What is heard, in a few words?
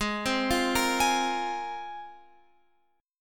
Ab 6th Add 9th Ab, Guitar, Open F; x,15,12,12,13,15; arpeggiated